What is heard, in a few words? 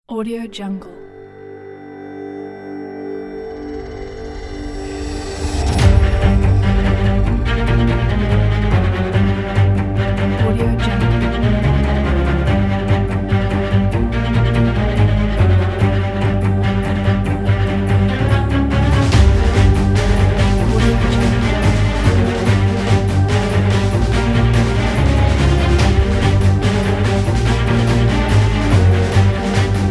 epic suspense cinematic